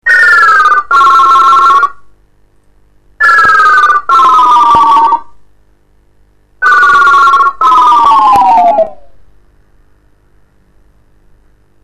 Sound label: crank phone; ringtones